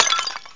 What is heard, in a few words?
POW